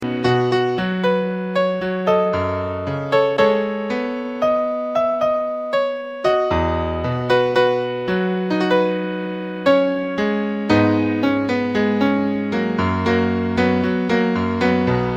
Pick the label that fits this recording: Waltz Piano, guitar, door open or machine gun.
Waltz Piano